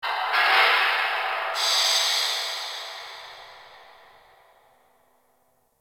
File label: Dist Steam Burst; Venus Solaris United